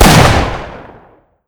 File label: spas12 shoot; tf2